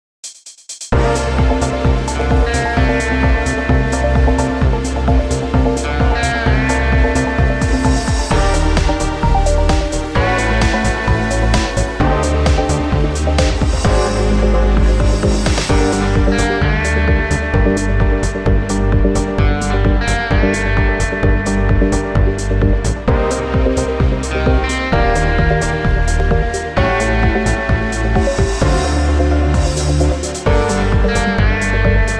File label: Gm smooth